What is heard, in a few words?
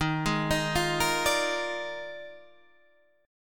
D sharp Major 7th Suspended 2nd Suspended 4th, Guitar, Standard; arpeggiated; JGuitar